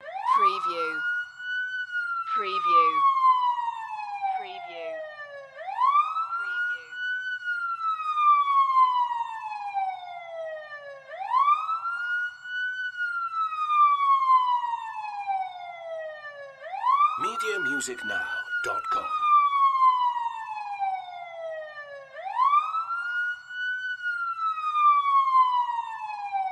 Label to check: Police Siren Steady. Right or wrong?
Right